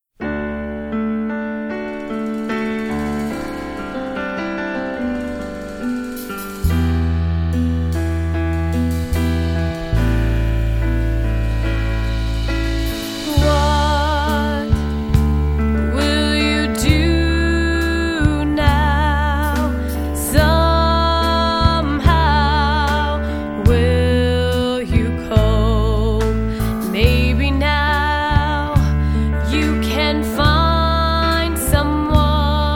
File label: keys